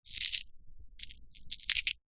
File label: potato knife movements in light twists